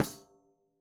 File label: daf Single hit; Raw Perc One Shots; Slate; SD SEISMIC Drum Sample Pack